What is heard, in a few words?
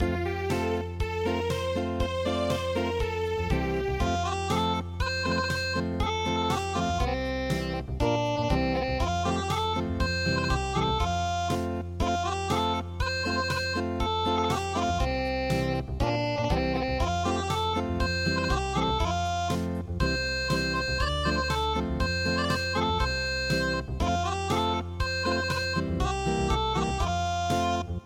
Japanese Traditional Wind Bell Unplugged; midi